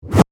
Hit Reverse Swipe; Production Element; BlastWave

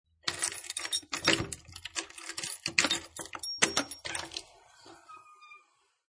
Rattle Keys, Unlock Door, Open Door; Miscellaneous Door Sound Effects; Lynne Music

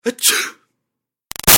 Human Male Sneeze; Sneezing; Sound Effects:Human; Big Room Sound

